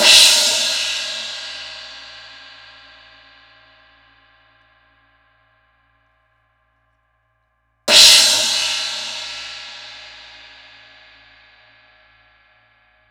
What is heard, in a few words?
X-Series Crash, cymbals